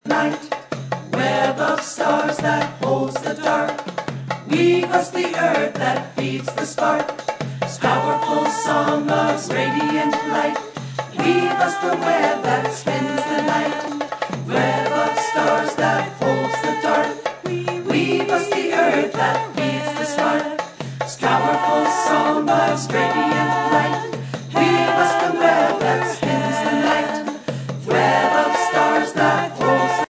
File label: Powerful Song